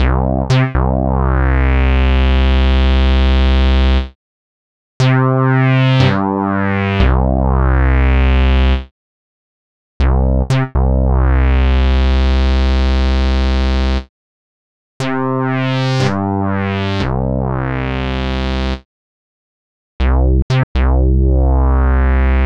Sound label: Jupiter 8 Neg Plug